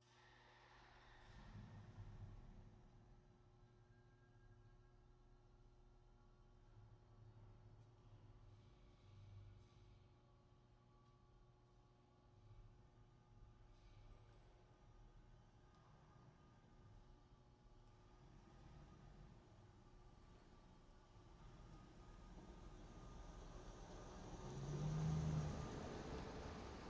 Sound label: truck driving in parking structure